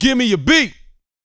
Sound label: VOX F, Vocal Planet R&B & Dance HIP-HOP; ILIO